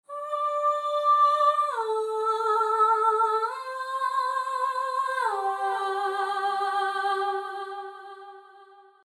Vocals Legato Aah Chorus Delay

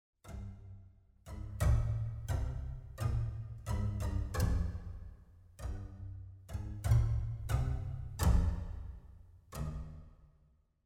Double Basses Col Legno; Audio Imperia Areia

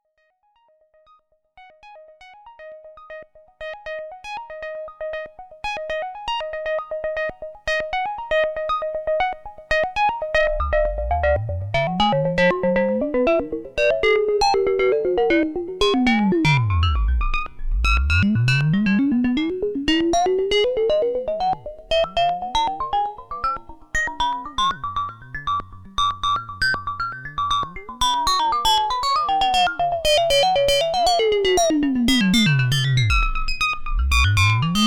ringmod sequ

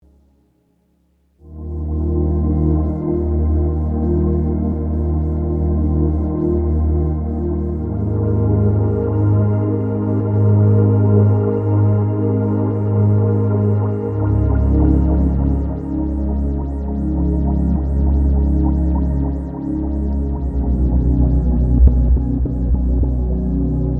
Dreamy pad; Sonicware Texture Lab

